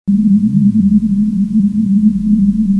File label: button